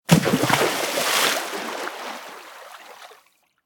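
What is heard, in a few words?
splash large; smite; poseidon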